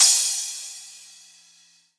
big crash f key

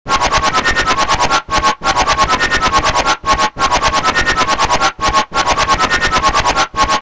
saw scary synth pluck techno fm - 137

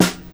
smooth acoustic snare f key; snare-drum-samples

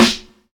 Snare Single Hit D Key; snare drum samples

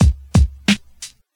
88 bpm old school hip hop breakbeat c sharp key

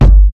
short steel kick drum sample g key; Stereo

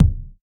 smooth kick sample c key

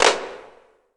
long tail reverb hand clap sound A key

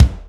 original kick drum sound C key

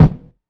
kick drum single hit d sharp key

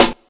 sparkle acoustic snare sample f key; snare drum samples

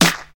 good steel snare drum f key; snare-drum-samples

